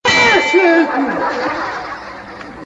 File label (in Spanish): golpe, chavo